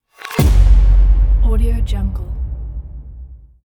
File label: opener impact